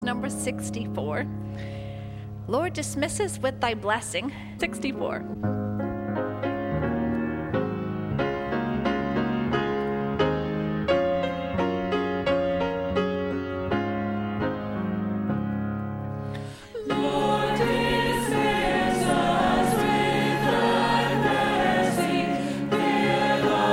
Lord Dismiss Us With Thy Blessing, SDA Hymns, Sabbath School Choir; ALL 2 Stanzas